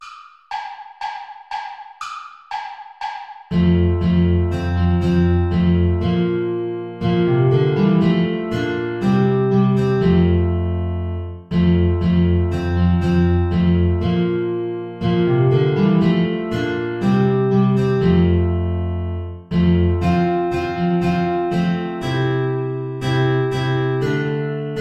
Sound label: play along without flute; steuerlein johann; mit lieb bin ich umfangen